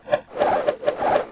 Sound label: Archery Barrage; Sid Meier's Civilization 4 Complete; Beyond the Sword; FfH Age of Ice; units